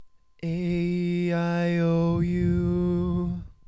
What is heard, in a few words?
aeiou Mid; A E I O U Sung in the middle range